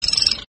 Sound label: beast sound; Caravan Beast